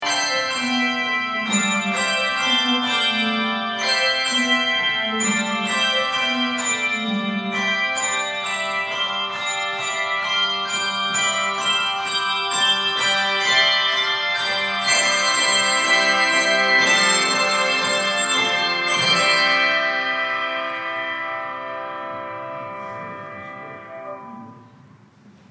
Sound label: Bell Open